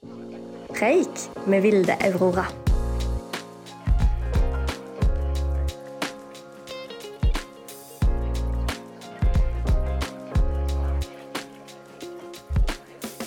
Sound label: Preik jingle intro